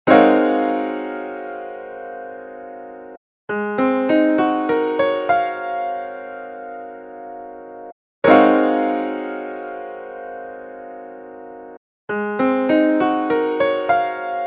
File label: Ab13 Piano chord